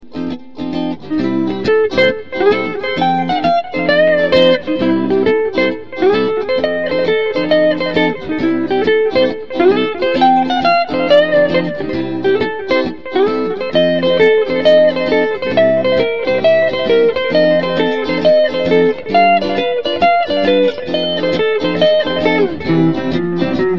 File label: C Bop, guitar